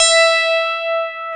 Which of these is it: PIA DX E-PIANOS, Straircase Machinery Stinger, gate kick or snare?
PIA DX E-PIANOS